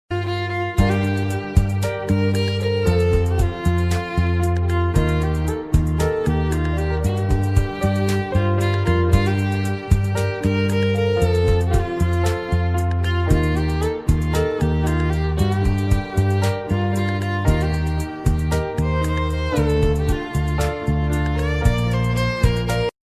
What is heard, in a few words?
instrumental ringtone bgm rock; new viral ringtone